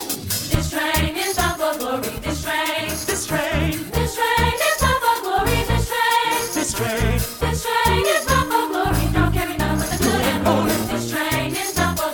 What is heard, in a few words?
This Train, Vivace